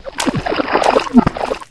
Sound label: barnacle die; Source Engine; video game; npc